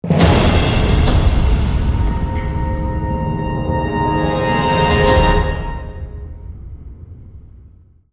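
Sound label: Music Stinger Snow Large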